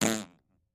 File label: Close Up Fart